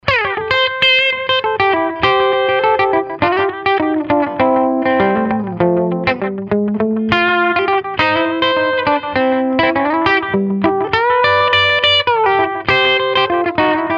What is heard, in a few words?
Delay; TubeDreamer72; Dyna-ssoR; Delay Llama